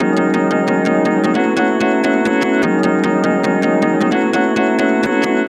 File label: 175 F- Round Arp